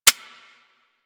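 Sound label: submachine gun Mech; Warframe; Tenno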